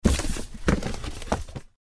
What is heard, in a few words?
Foley Rummage in Cupboard; The 39 Steps